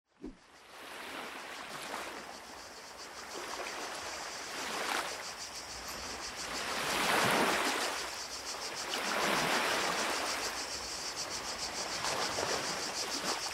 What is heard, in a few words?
cigarra y oceano - Cicadas; sonoterapia; ANIMAL FLOW